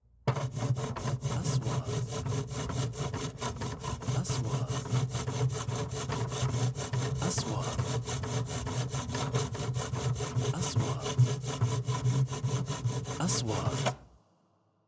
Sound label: Wood